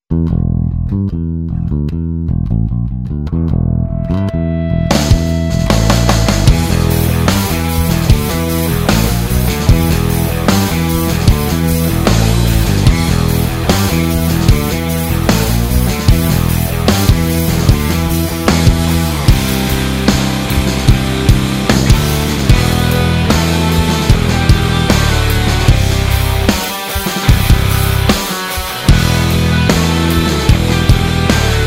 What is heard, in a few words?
hardrock rounds both; lakland